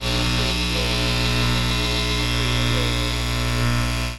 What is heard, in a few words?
Electric High Voltage